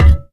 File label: physics cube impact hard